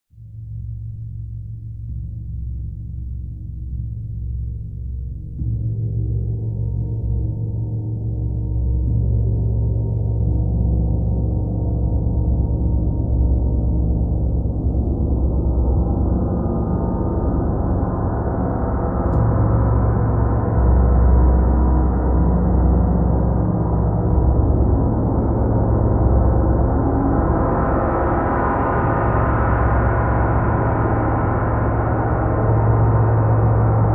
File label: Healing Gong